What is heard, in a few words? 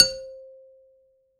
Toy Piano; ESW Sphere